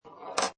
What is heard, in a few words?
Pinball Sound Ball Rolls And Comes To A Stop; Video Game Sound Effects